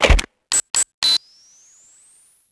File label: Tripmine Armed; Max Payne